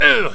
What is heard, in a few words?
pain